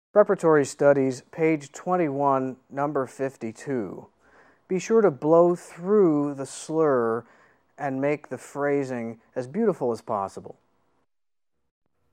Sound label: Horn